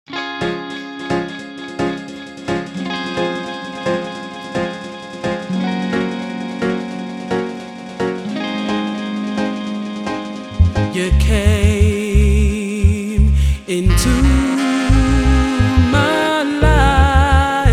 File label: Vocal Dub